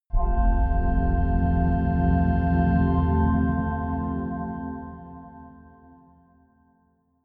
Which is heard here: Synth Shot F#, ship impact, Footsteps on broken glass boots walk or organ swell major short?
organ swell major short